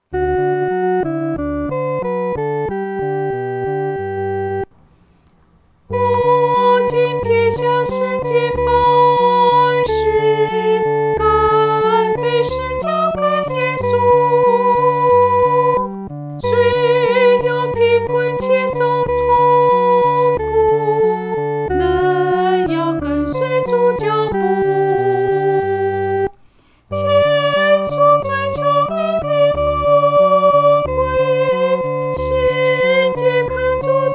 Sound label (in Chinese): 独唱 第一声